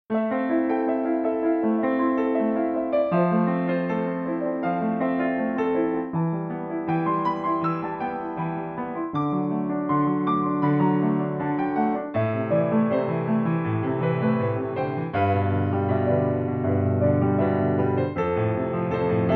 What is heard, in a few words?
Piano